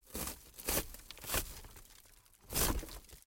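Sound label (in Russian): хруст и разрушение лобового стекла авто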